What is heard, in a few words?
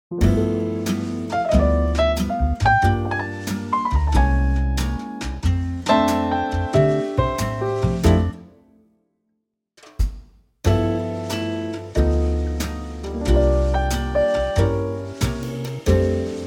F quartet